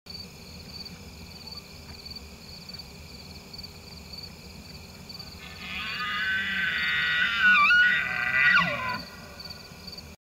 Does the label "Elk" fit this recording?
Yes